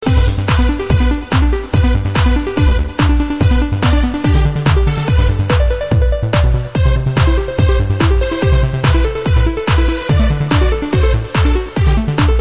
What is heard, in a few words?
jingle bells club; ringtones